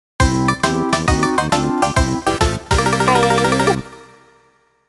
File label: Lose Life; Banjo-Kazooie